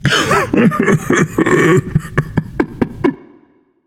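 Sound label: non-verbal boohoo; smite; atlas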